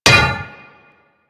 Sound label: Melee Hammer Impact Generic